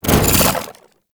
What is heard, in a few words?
Jump; Warframe; Corpus; Arachnoid Wraith; Abilities